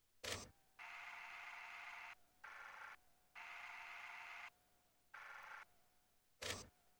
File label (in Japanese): ヘッドホンボリューム15 フィルタは無いが音量大きい; inateck